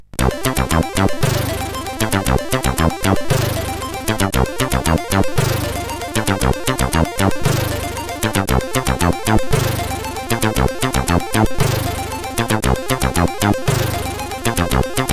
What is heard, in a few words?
Melo Funk, sid CSG8580R5